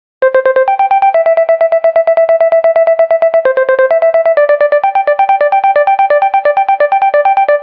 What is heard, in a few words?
Infinix Ringtone